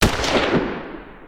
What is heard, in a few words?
extra wilds in free spins; Wild Guns